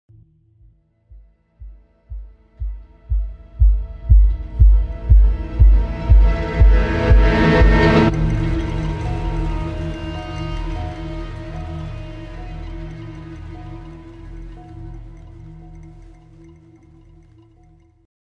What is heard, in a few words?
Trailer Music